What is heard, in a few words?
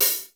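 HH, hi-hat; LITE KITS; Crypton; DrumBase5000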